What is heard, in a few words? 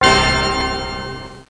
BIG STAB